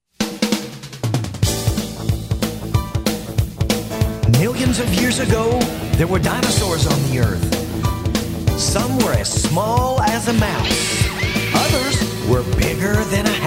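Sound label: Up Tempo Rock Shuffle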